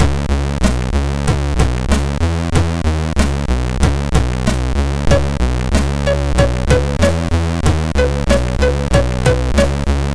Intro Music, MOS6581R2

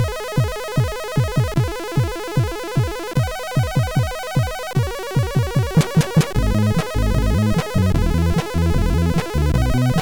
Disco One, MOS6581R4; Compod

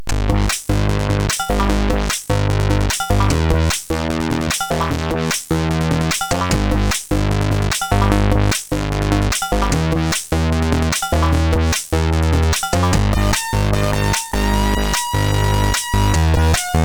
Lil Bass, CSG8580R5; sid

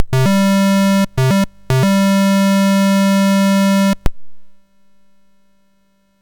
Dragonworld sid MOS6581R4

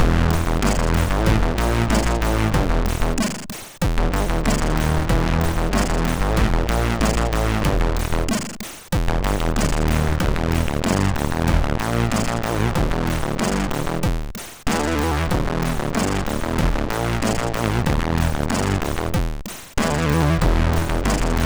Heavy Riff, MOS6581; MOS6581R3; sid; hvsc

